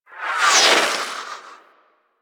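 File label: Super Weapon Missile Projectile By; Warframe; Vehicles; RailJack; Proc Ships; Corpus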